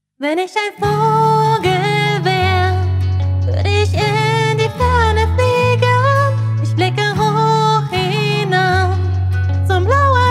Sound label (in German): German Cover